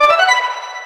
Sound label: horn; MK8; Peach